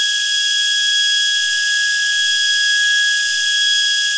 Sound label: P-51D cockpit gyro, aircraft; DCS